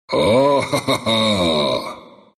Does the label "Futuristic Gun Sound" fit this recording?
No